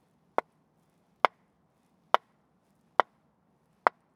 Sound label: knocking